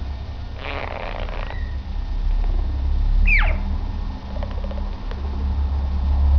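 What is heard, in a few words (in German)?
knurren gurren, wellensittich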